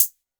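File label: 808 closed hi hat; 808 SOFT; Wizoo - Platinum 24 Electronic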